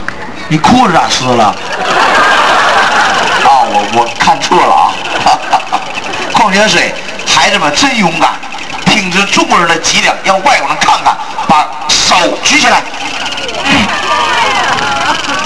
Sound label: ditan rollerbladers; china